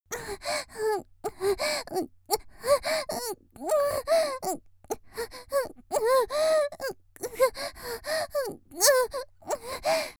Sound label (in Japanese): 喘ぎ 無理矢理されて嫌がる; 綾波系; 少女